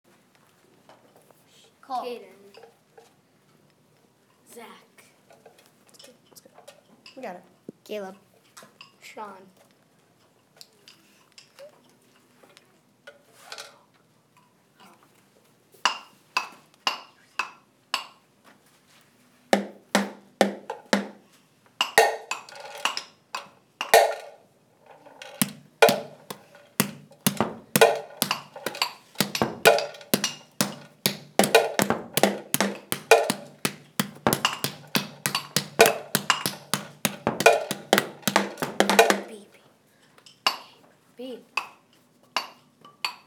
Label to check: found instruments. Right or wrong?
Right